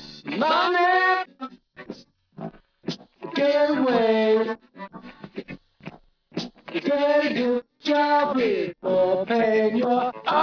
voice

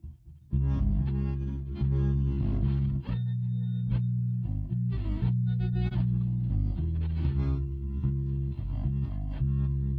nodrums

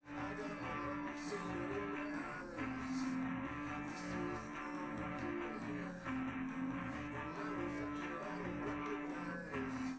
drums live rec

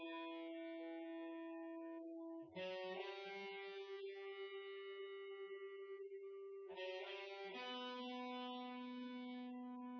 wdrums synthconv 250ms 1m; SiSEC08; SiSEC underdetermined

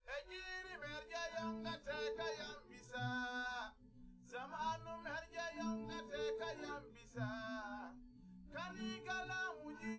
no drums live rec; SiSEC10; underdetermined

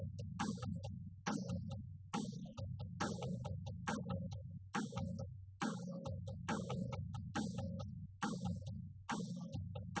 wdrums liverec; SiSEC08; SiSEC underdetermined

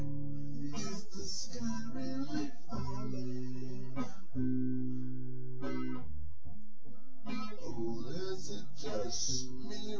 nodrums synthconv 250ms 5cm

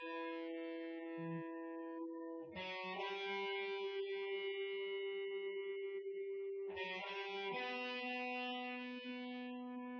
wdrums synthconv 250ms 5cm; SiSEC08; SiSEC underdetermined

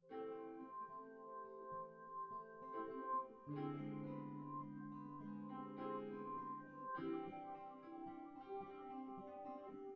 no drums synth conv 1m sim; SASSEC07

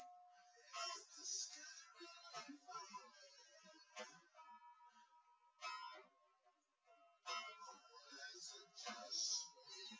nodrums; SiSEC08; SiSEC underdetermined

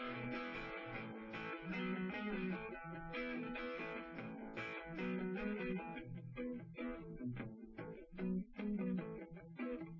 wdrums liverec 250ms 5cm sim; SiSEC08; SiSEC underdetermined

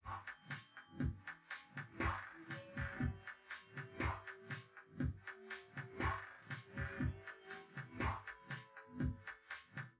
wdrums

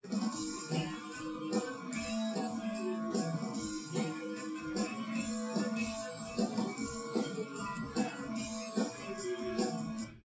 drums; SiSEC11; underdetermined

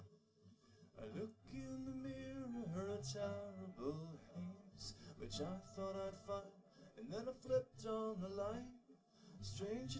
nodrums, live rec

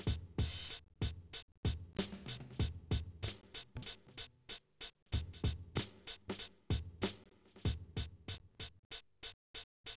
wdrums inst sim; SASSEC07

